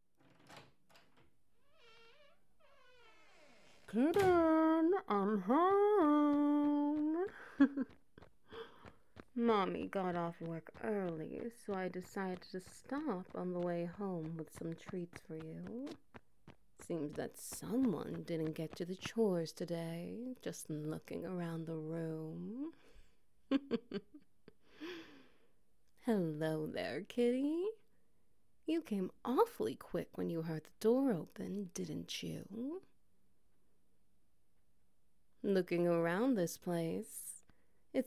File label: Wet Noises; Home Early